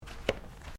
FOOTSTEPS THONGS (FLIPFLOPS) CARPET STEP; Footstep Foley; Footsteps (Sound Effects:Human)

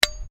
METAL STRIKE; Materials

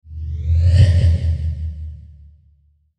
Whoosh Flange Delay Reverb; Blastwave; Whoosh Sound Effects

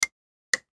Switch Press Release; Metallic; Multimedia; Blastwave

